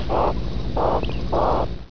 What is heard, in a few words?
grass